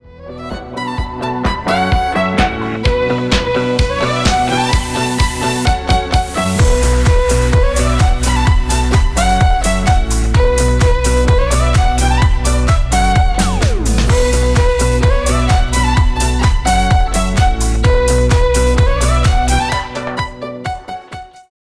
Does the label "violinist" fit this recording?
Yes